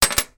office small filing cabinet key drop on filing cabinet top